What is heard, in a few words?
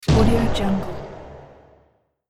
giant footstep